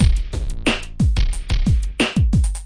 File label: two big drums and triplets, 090 bpm, drum loop